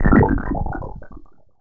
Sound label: poison